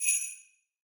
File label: sleigh bell